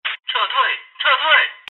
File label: radio fallback